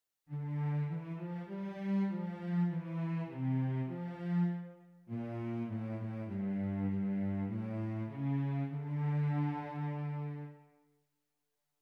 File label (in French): VIOLONCELLE